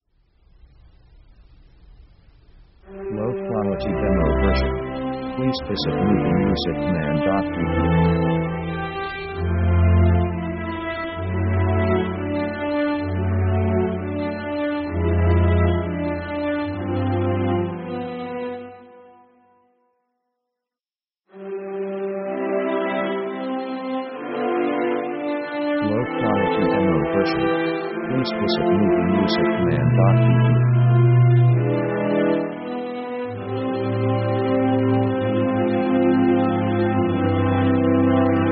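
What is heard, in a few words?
orchestra italy, slow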